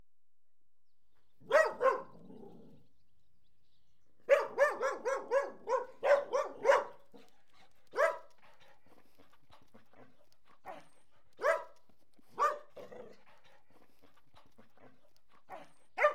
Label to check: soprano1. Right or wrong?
Wrong